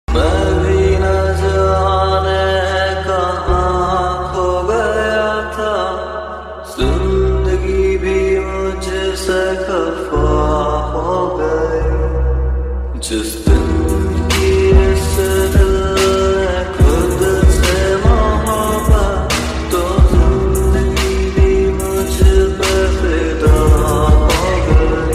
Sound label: Hindi Sad Version Ringtone; ringtones